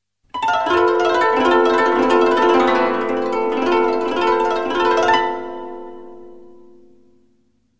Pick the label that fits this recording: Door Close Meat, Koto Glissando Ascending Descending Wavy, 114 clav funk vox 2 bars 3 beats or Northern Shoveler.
Koto Glissando Ascending Descending Wavy